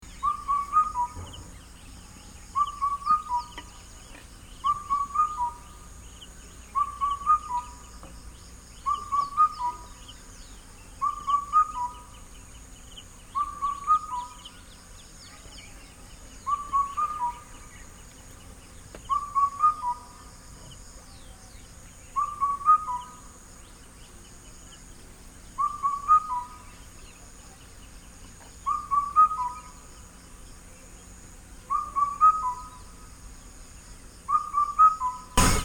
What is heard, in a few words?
alarm clock, nepal